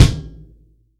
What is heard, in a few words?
TUBE KICK